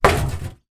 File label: industrial tool box metal lid impact; zapsplat